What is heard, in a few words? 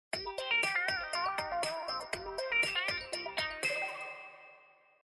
sms; Huawei C5589